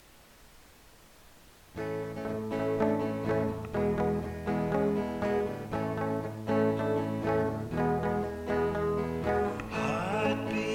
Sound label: heartbeat